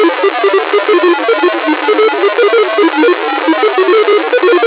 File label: VComm Jammer